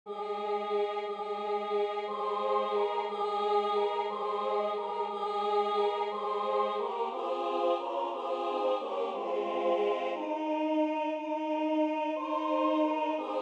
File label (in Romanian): Sculati sculati boieri mari, Basarabia, colinde